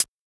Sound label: Expand HiHat; Sonic Foundry (Sony Creative Software); Drums & Drones; Construction Kits